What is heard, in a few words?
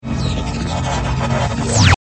transporter materialization problem